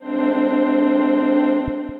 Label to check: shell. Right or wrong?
Wrong